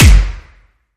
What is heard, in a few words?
Perfect Timing Light Hit